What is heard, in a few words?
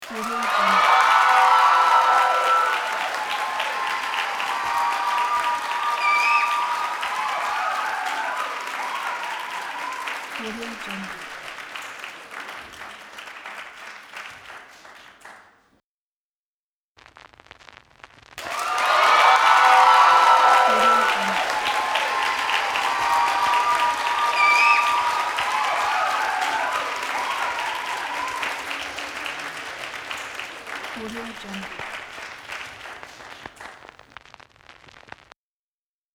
very excited cheers and applause pack